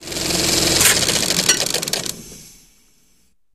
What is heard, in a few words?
youtube upload finished; replay